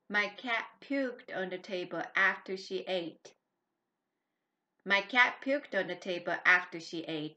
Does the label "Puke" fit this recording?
Yes